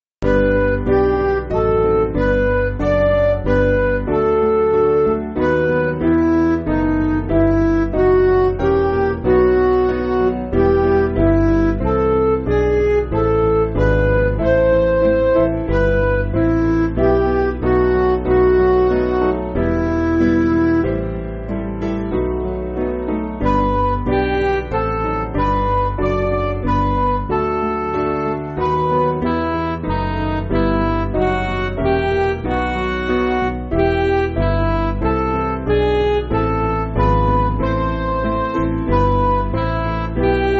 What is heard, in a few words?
Midi